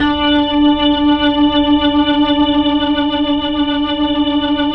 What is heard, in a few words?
HAMMOND 3D R; 3D Sound; 3D Keys VOL-1